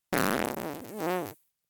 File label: fart; vegan